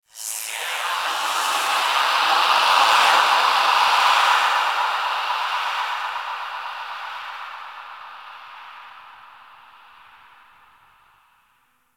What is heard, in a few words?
Chamber Whisper; Orokin; Warframe; Sacrifice; Ambience